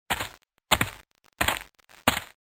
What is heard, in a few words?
walk dirt